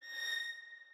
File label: Violin o5 Bb